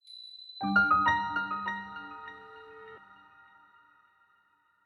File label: Location Open Main; the legend of zelda tears of the kingdom